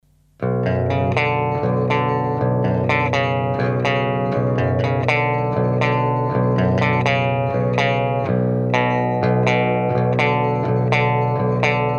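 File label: EMG LTD clean, 7string; EMG LTD czysty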